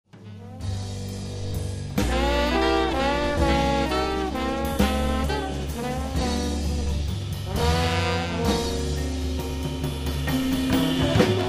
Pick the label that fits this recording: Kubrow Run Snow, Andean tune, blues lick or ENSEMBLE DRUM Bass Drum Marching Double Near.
blues lick